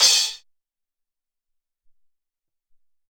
Choke Cym, Alesis SR-18

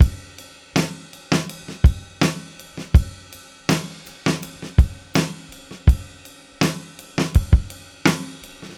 Beat Loops; Zero-G; Monster Beats

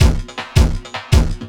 Drum & Bass, 160 BPM

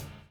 DOWN, DOWN KIT 78

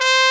C3, BRASS

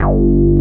Synth Bass, Analog Bs

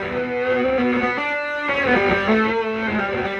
Fierce, Electric Guitar

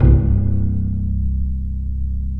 Bass Piz E C0; Digital Sound Factory Virtuoso

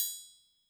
Triangle Open, YAMAHA RM1X

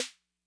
Snare Max Tone Max Snap, 808; classic machines

